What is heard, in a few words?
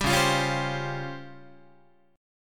C sharp Major 7th Flat 5th Guitar; C#M7b5; strummed